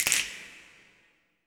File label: snap afro, afro snaps, hands